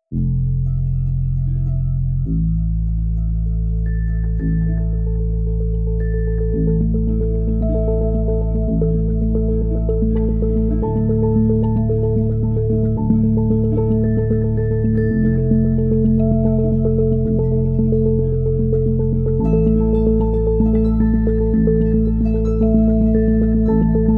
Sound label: Neutral Lights Emerging, No Drums